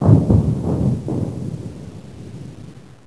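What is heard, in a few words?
explosion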